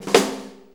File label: 4-4 Snare Flam, Drum Loops